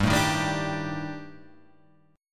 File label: D sharp Major 7th Flat 5th, G, guitar chord